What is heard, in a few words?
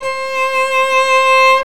CLS LG FF C4; Best Service; Peter Siedlaczek's Advanced Orchestra